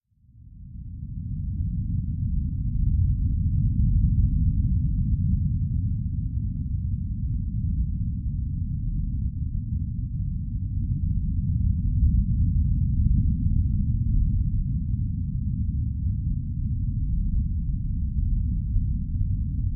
Underwater Ambience Bottom Of The Ocean - Rumble Underwater Waves And Bubbles; Hollywood Edge; sound designer's toolkit v2